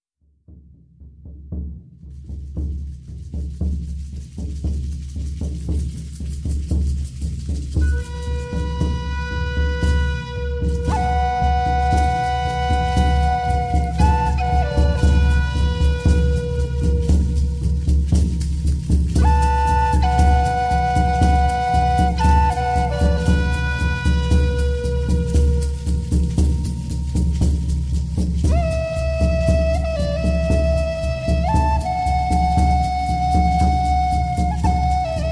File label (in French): musique chamanique tambour